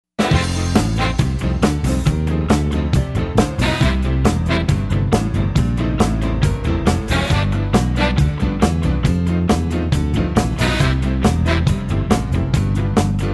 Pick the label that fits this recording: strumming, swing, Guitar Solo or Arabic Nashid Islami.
swing